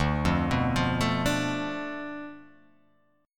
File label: D Minor 6th